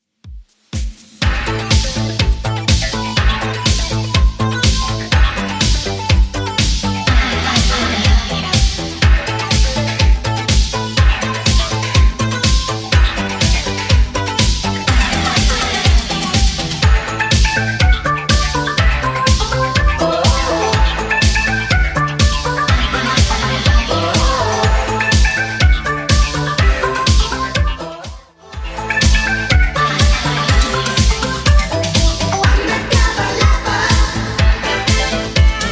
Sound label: 80s Redrum 123bpm